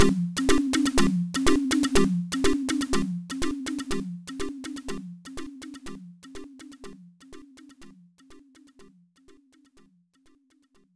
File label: Loop Rhythm; rpg tsukuru dante98 ii pc-98 1996